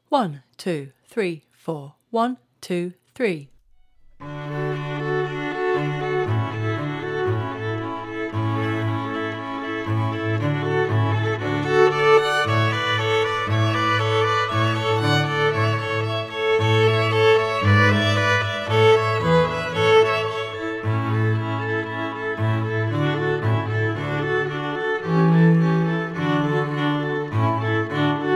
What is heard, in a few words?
The Birdcatcher Song backing